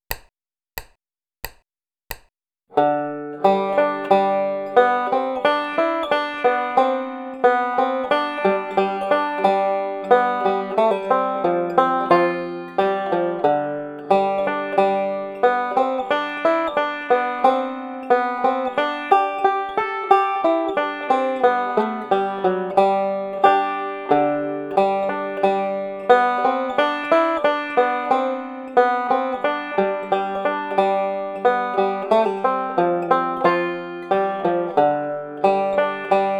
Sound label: melodic style; red haired boy